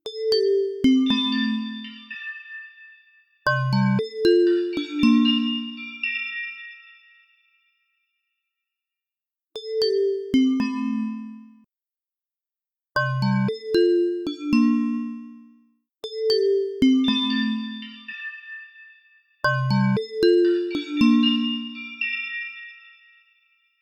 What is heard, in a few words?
Synth Arp Ghostly; Ghostly Callback; Temperance Lite